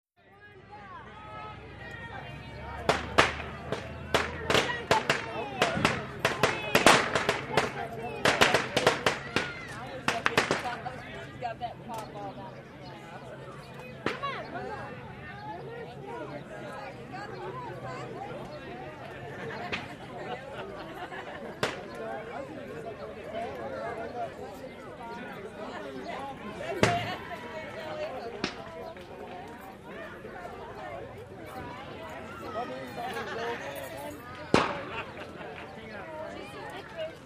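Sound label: Outdoor Barbeque Party, laughs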